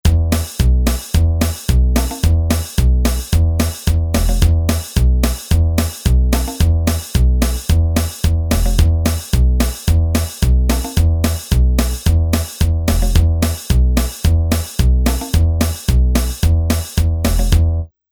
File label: Country